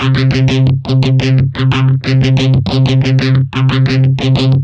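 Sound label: GUI L1 C, guitar; Best Service Gigapack 2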